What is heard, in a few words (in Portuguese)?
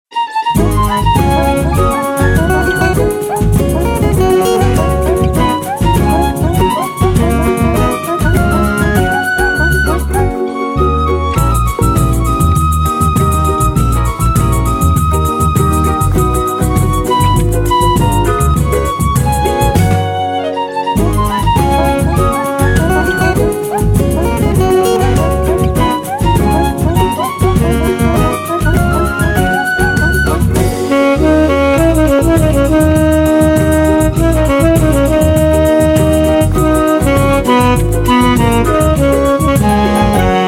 SAMBA